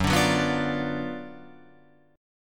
Gb Major 7th Suspended 4th Sharp 5th, Guitar Open F; GbM7sus4#5 strummed; 6,9,6,6,8,9